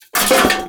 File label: objects, World of Tanks